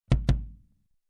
Close Kick Drum Hits

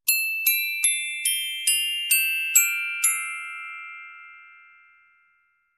Glockenspiel harmony scale down

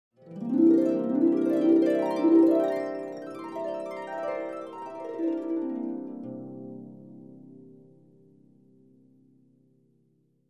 Soft harp dreaming up and down glissando

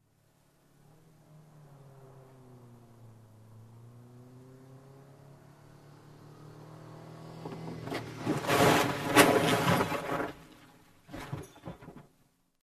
Approaching Car Crash

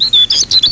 Robin chirping short

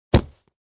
Hammer Hitting

